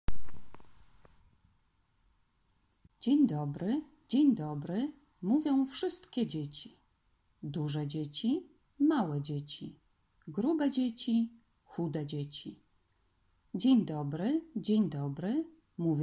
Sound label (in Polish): Mazurek